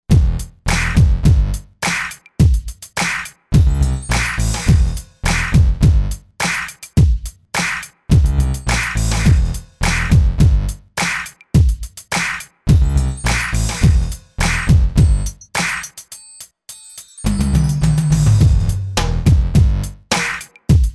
80s FUNK, Factory patterns; AKAI XR20

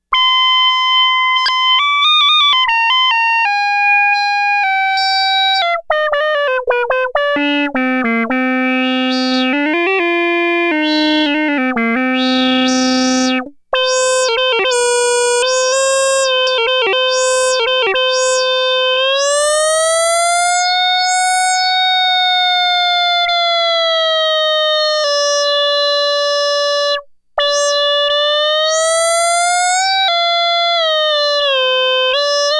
Space Reed, Roland SH-2000

